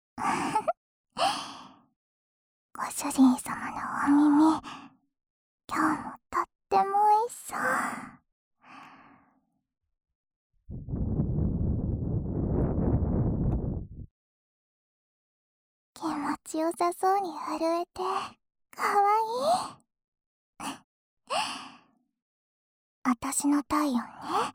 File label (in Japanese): あま～い耳舐め 左耳, ASMR